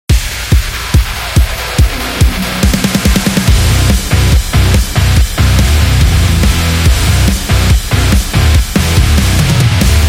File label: Hard Rock Metal